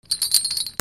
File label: BANGS